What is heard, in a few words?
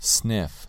sniff